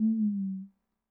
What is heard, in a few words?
conga, latin